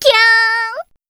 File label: combo burst; osu!; Retronix 2.0 HD optimized